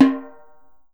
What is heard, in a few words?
SOPRANO, Steel Percussion; E-MU Producer Series Vol. 7 – Old World Instruments